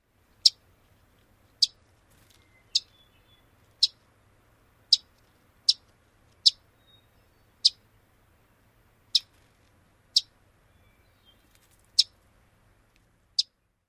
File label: alarm chips